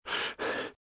Breathe - Fast Heavy; RadwinIsland

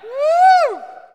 Hockey Male Woo; Hockey Vocal Sounds

